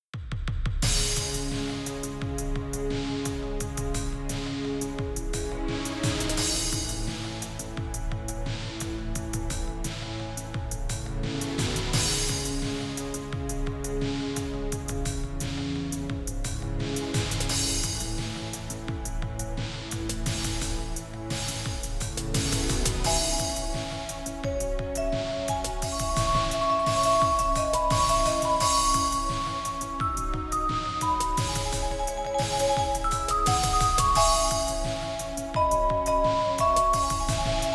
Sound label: Ambient ver.